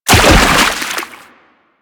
Land Heavy Water, Footsteps; Enemies; Warframe; Teshin; DE

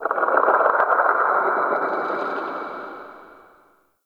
Under City Texture Mid Croak; Ambience; Dungeons; One Offs

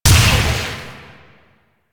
Shot Small; Weapons

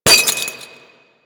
Glass Bullet Impact; Warframe; Ambience; Nineteen Ninety Nine

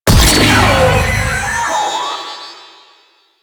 Security Camera Deactivate; Sentient; Warframe; Ambience; Sentient Devourer

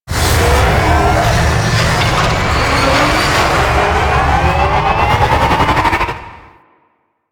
Crp Ship Fighter Thruster Charge B; Ambience; Props; Warframe; Corpus Ship Remaster

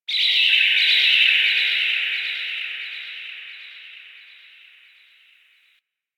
Forest Distant Bird; Ambience; Warframe; Grineer Forest

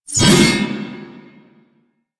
Backstab Impact Target; Warframe; Warframes; Pax Duviricus

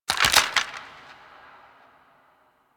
Bone Break; Golden Maw; Warframe; Ambience; The War Within

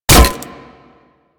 Light Glaive Catch; Warframe

